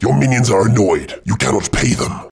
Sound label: Annoyed